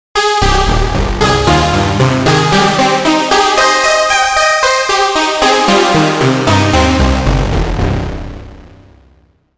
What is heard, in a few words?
Keys